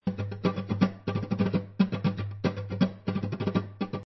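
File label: dry guitar rasgueado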